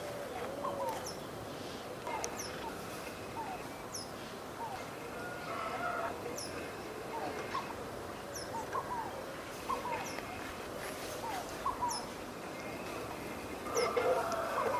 Blue tufted starthroat; پرنده اخترگلوی جقهآبی

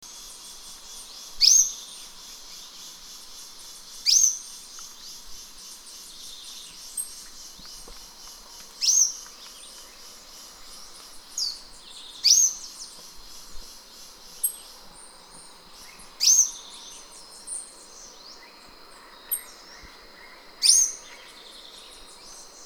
Euphonia violacea

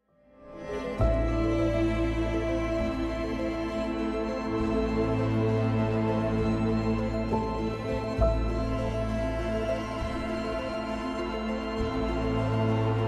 Cosmic music

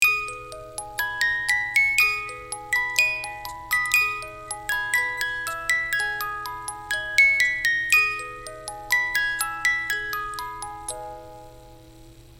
swan lake tiny keychain music box loopable

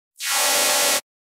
Laser scanning

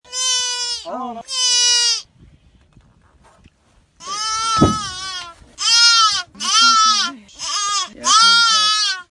fawn bleats

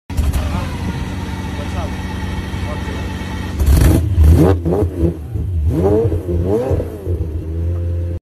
Pagani Zonda

